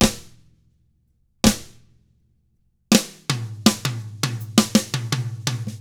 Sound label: ROCK; ILIO; Double Platinum Drums 1; 164 ROCK